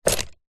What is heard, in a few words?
sport body builder belt catch